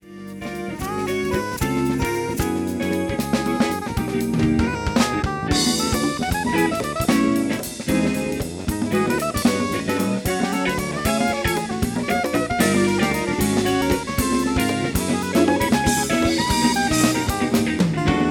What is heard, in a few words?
rough mix